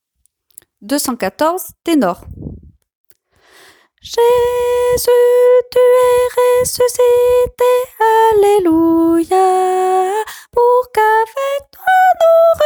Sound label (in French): ténor